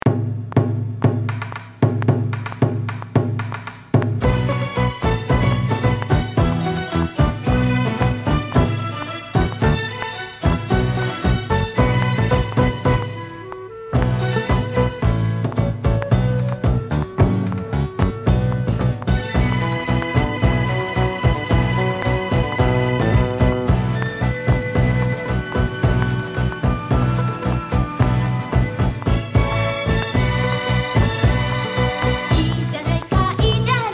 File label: karaoke